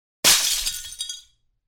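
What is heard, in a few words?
something fragile glass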